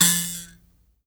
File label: MBIRA F 3; BS MBIRA2; Heart of Africa